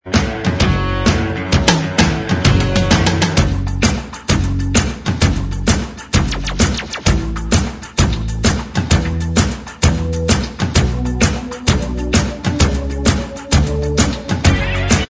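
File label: instrumental, backing track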